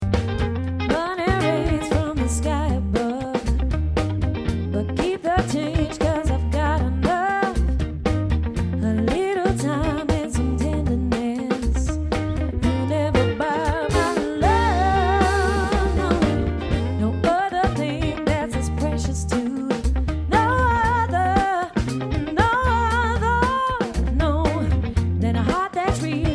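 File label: swing, gypsy swing twist on popular songs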